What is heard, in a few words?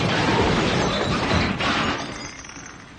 Crash Short